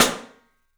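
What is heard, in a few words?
PICOLO SNRS, snare, drums; 3D Sound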